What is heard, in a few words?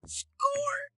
score yell